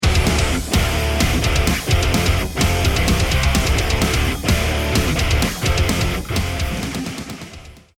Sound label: won round